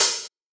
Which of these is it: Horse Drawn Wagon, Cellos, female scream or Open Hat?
Open Hat